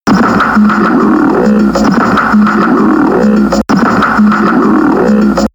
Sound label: music